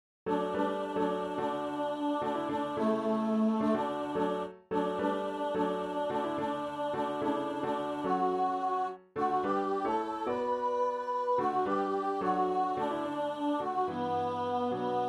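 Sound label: alto D, Fellowships - Alto practice file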